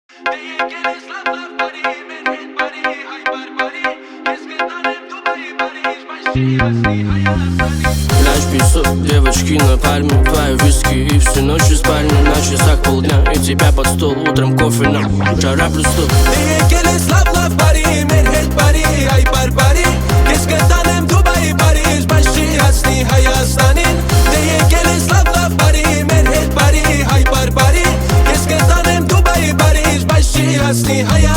lezginka; vostochnye; armjane

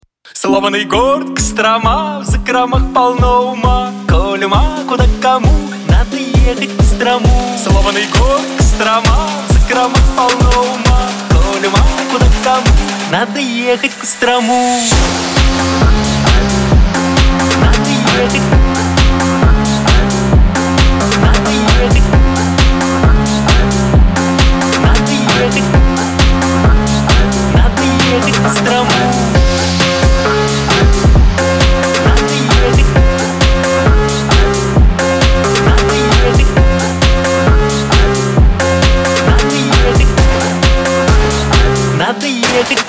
phonk

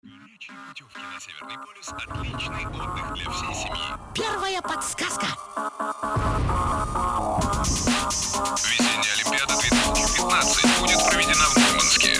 phonk

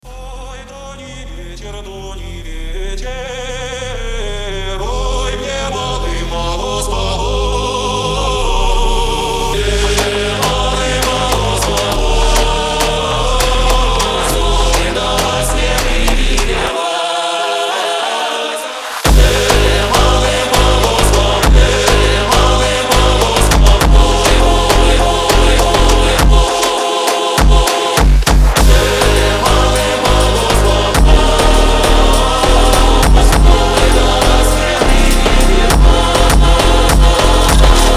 funk

